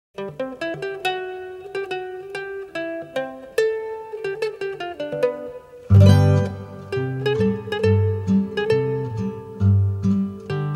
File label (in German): Zither Ballade